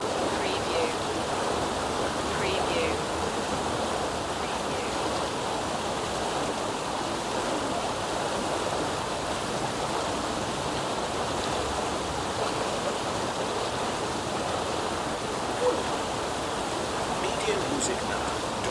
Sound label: public pool ambience